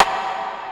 drum hit clap

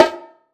bongo hi

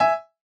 chorus chord G#6 E6

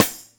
whistle

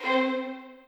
strings

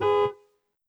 normal hitwhistle

